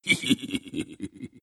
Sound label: laugh; Brew